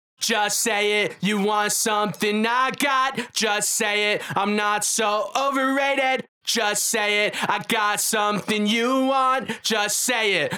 VOX DARK TIGHT

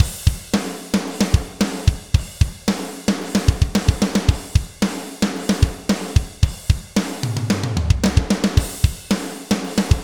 drums off; dynamic tone shaper

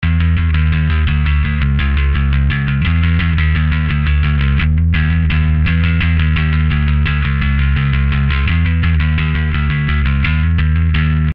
bass, bass overdrive; honeycomb bass od

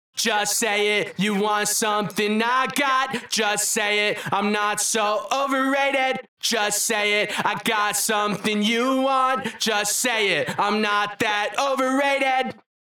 VOX DARK LOOSE

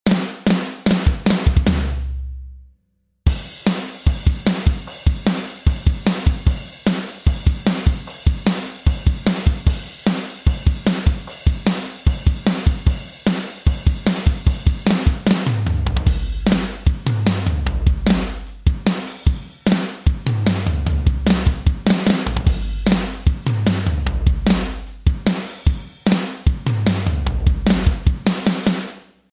snare low drums; desert drums

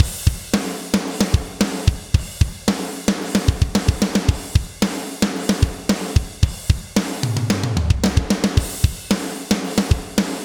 drums on; dynamic tone shaper

